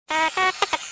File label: mouse go; Star Wars Jedi Knight II Jedi Outcast; XBOX